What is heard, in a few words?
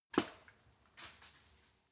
wood limb crack big